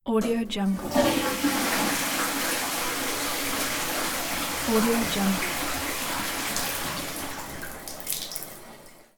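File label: toilet sound; wc sound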